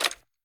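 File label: gear rattle weapon large; viper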